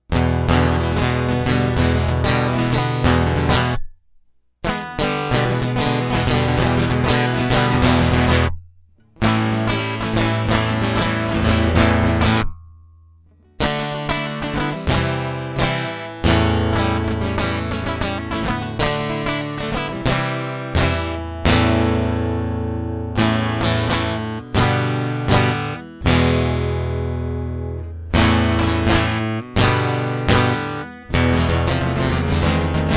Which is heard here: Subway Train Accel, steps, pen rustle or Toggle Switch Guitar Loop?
Toggle Switch Guitar Loop